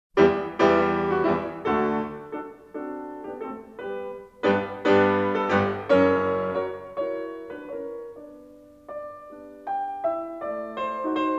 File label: Piano Sonata No. 27 in E minor; Wilhelm Kempff; Beethoven; Piano Sonatas